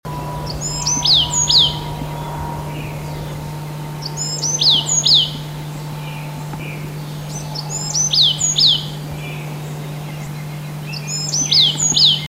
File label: Bewicks Wren